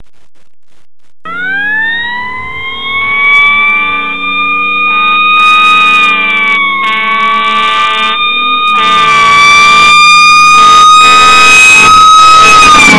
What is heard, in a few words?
fire engine